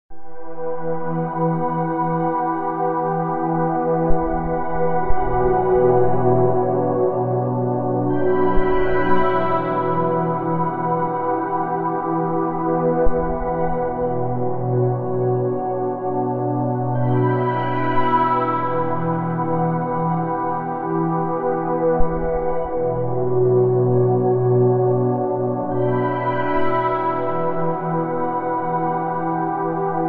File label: end music; cry of fear